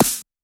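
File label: set of small drums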